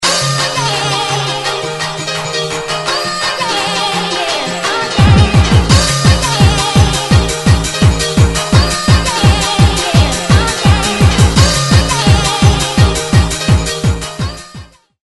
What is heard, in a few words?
Stupid Bass; cstrike, mapend